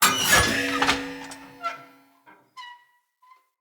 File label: Light Mirror Hit Move A; Puzzles